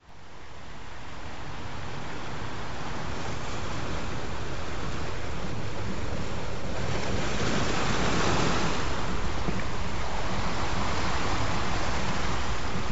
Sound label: Rife Frequencies; Powerful Psoriasis Healing; Treatment 1; Skin Health & Nutrition; Relaxing Ocean